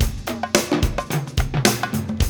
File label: BREAK; ZERO-G; Datafile